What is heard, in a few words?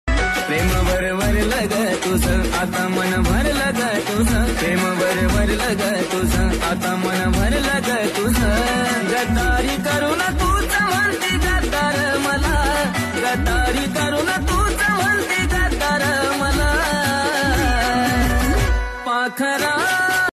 Marathi ringtone